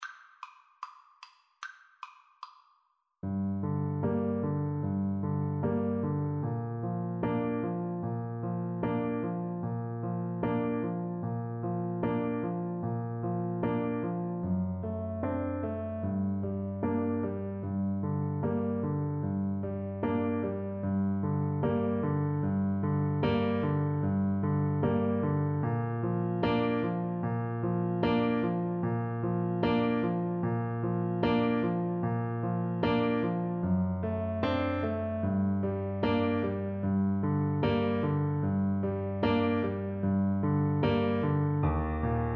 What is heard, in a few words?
el condor passa, Tuba